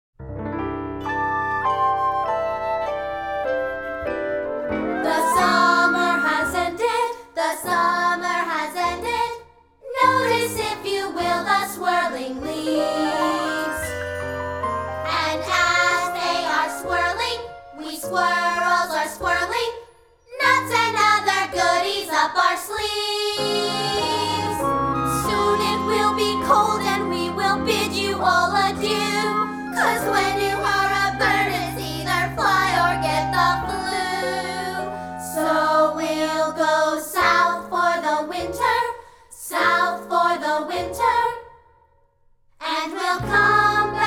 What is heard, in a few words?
leaves; A Year with Frog and Toad